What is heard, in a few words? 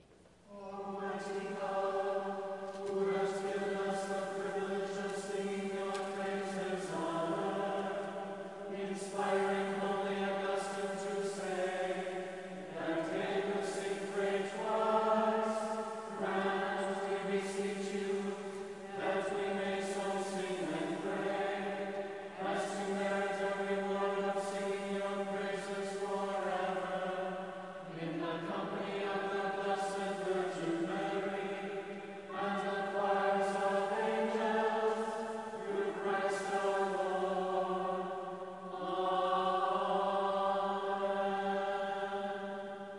CHOIR PRAYER